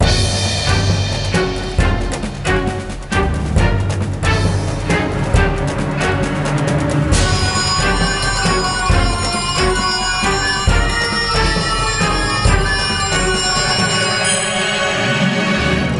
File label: Imperial Battle; Lego Battles 2009 NDS